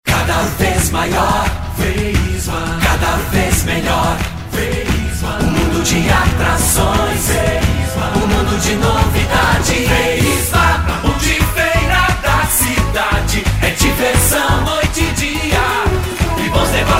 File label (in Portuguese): contrabaixo